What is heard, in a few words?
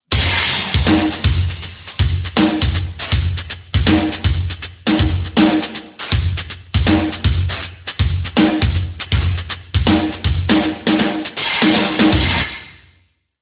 ringtone slow and cool, drumbeat